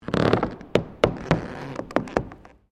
Floorboards Creak Long, wooden floorboards creaking; Objects